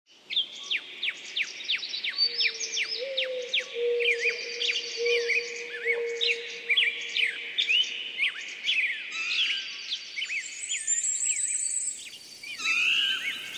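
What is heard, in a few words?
magic fairy sounds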